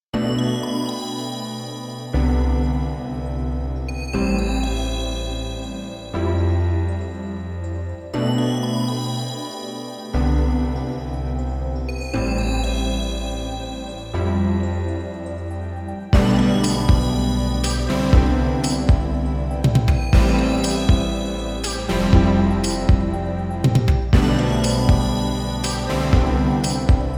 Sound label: Hardwaresynths mix; Savant Audio QRS; Quantec QRS